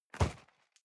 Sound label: cardboard box medium place rustle; objekte